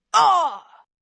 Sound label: Pain Oahh, Grunt, Impact, 1 child, in pain, human voice, kid, child, groan, moan; Sound Effects:Voice; VoiceBox